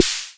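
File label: snare drum, Boss DR RHYTHM 55 drum machine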